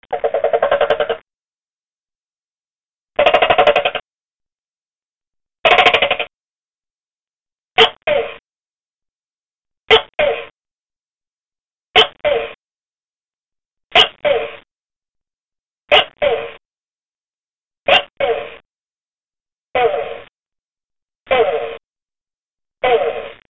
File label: Male Gecko Mating Call